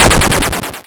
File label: Cry